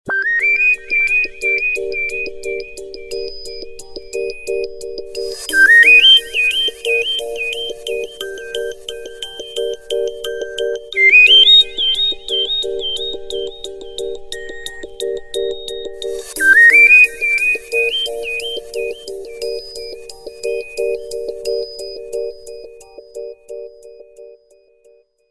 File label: Footsteps; Qiku Luna Youth